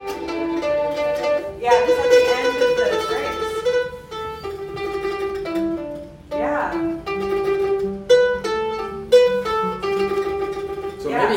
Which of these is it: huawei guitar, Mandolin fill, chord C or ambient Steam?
Mandolin fill